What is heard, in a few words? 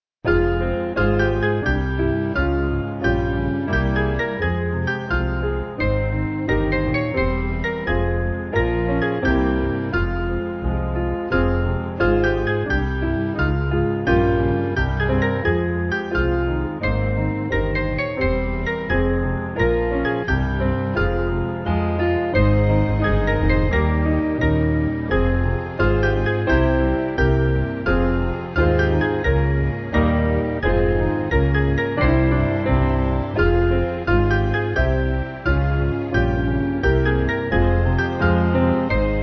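Band, Music Backing; Ebenezer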